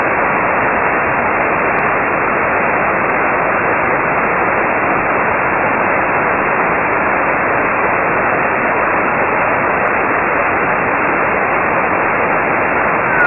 geminide doppler; navspasur

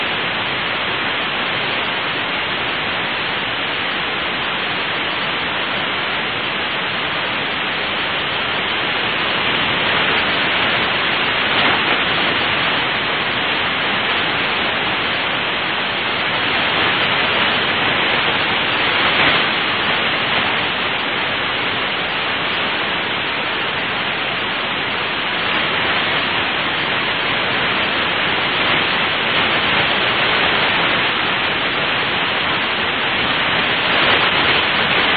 jupiter l burst hf

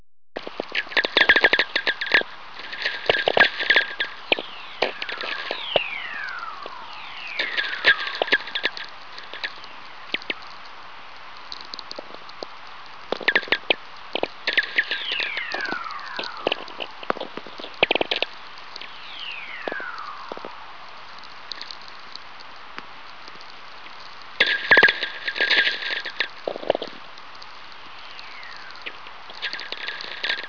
vlf whistlers tweeks; north sf; mcgreevy

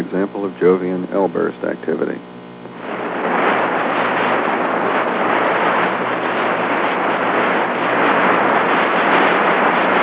jupiter l burst; ufro

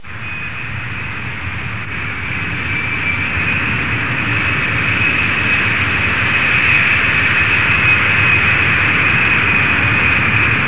b52 taxi

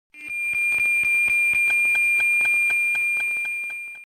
mode fax Meteosat short

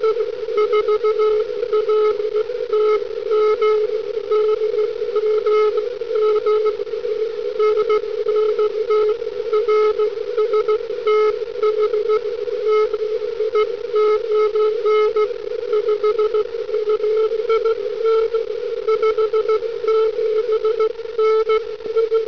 eme cw

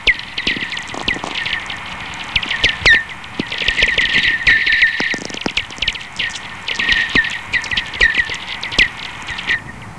lightning tweeks 100hz 30khz

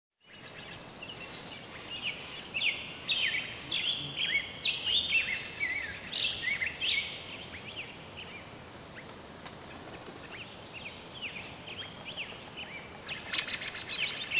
forest birds; butano

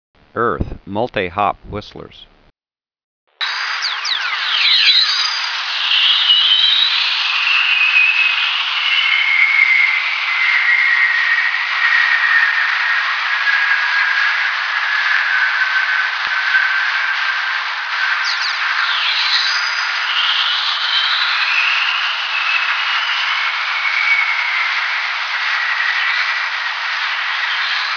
geomagnet multi hop; uiowa

